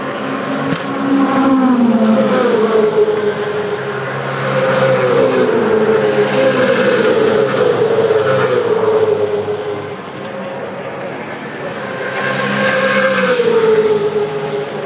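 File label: the roar of the V8's at Melbourne GP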